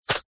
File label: animals snake spit